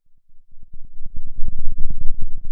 Sound of a thunderstorm, center

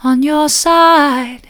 soft, vocal

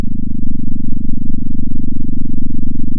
pop, sound of a small motorcycle

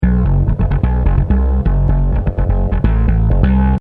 scrambled bass mono

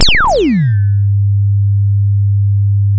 sound of a bike bell

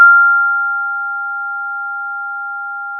Sound of a school bell

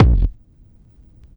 Vinyl Kick, drum

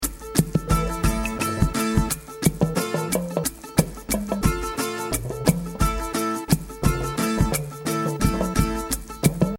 loop mono

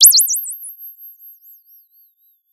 Sound of a bird tweeting nicely

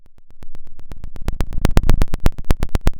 pop, Sound of a fire crackling

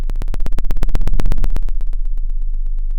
Sound of a fire crackling

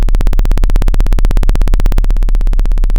Sound of a plane taking off

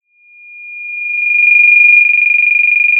pop, Sound of a phone ringing

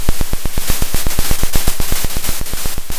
Sound of a drum roll

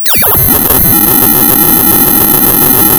pop, tearing paper

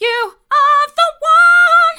hard, vocal

